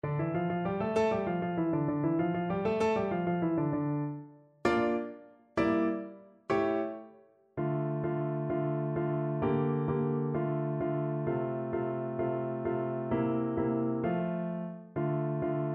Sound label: vn pf; burgmuller; tarantella